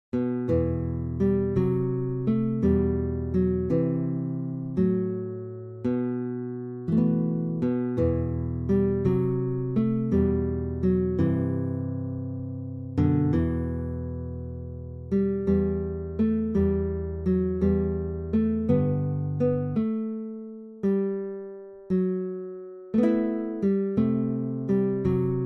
gabriels message guitar; christmas music and carols

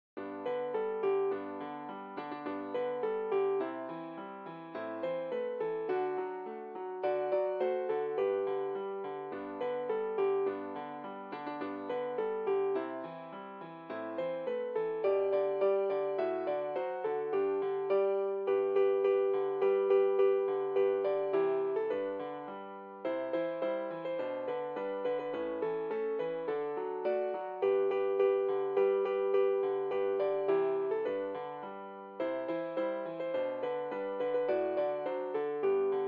Jingle Bells keyboard; christmas music and carols

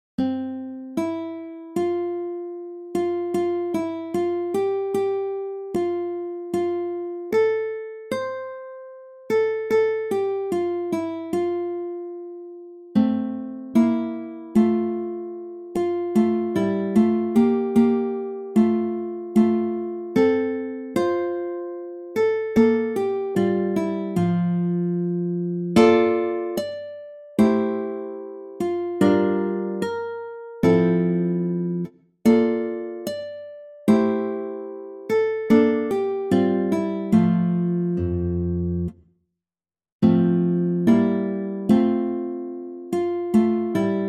once in royal guitar

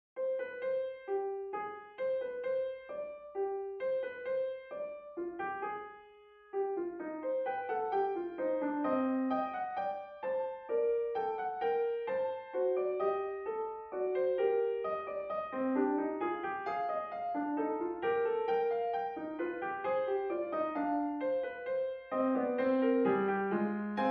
fugue

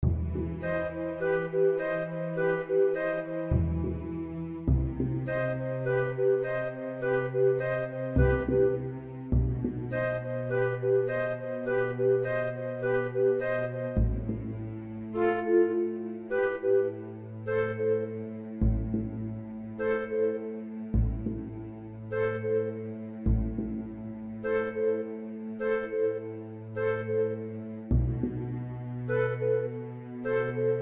deep water game loop

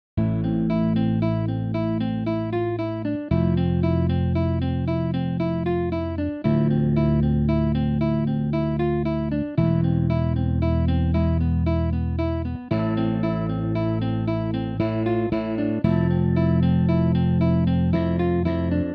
suspense theme; true grit

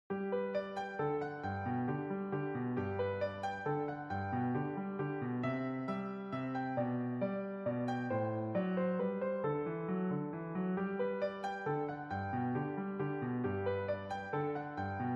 minuet

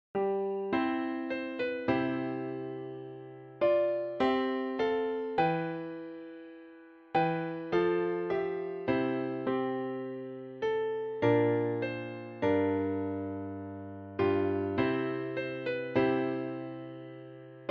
the wexford carol piano; christmas music and carols

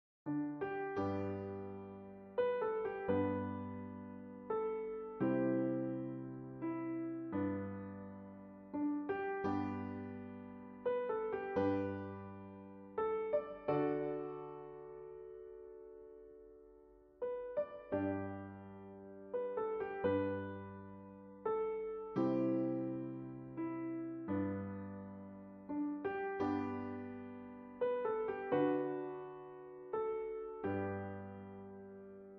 Amazing Grace piano; "Amazing Grace" is one of the most endearing hymns ever written

